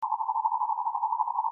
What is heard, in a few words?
bark